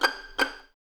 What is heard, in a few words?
Violins Pizz, String Ensembles; Miroslav Vitous; 11 Violins